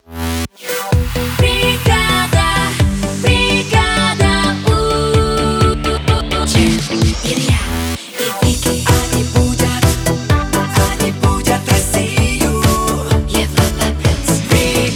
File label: 128bpm Main ID, jingle; Europa Plus